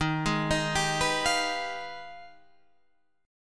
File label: Ab Minor Major 13th